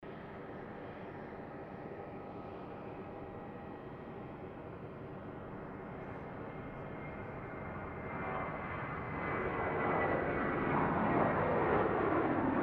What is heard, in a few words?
Airbus A320 flies in distance